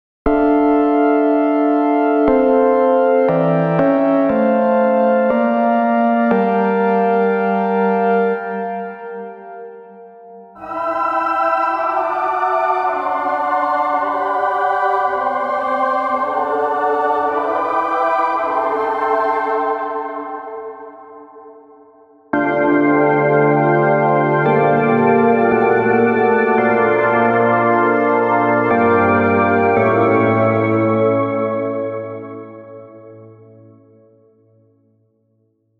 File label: Chords Gospel; Chord Progression Demo; Unison MIDI Wizard Plug-In